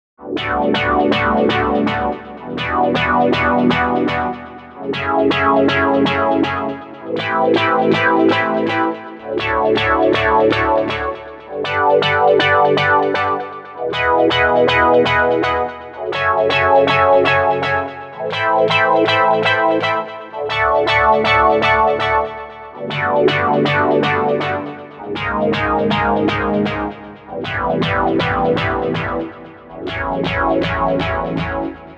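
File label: rhythmic for chords; bellatrix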